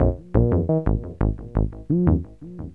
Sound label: groove